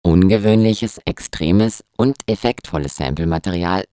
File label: processor pitch -12